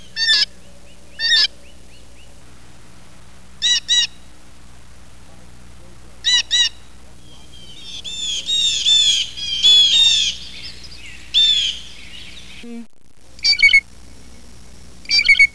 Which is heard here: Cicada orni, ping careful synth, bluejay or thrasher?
bluejay